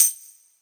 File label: tambourine